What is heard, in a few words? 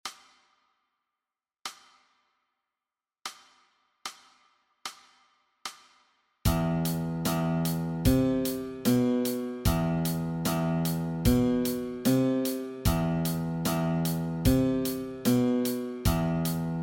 Guitar Exercise